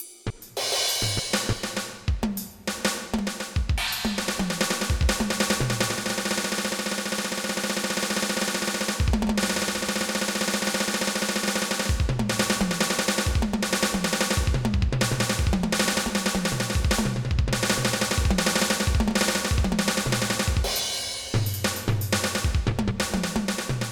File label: drum